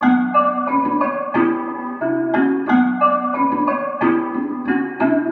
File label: Dark Melody Loop 180 BPM B Min; Cymatics; Oracle Sample Pack